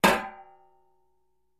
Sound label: Metal Hit Ring, small impacts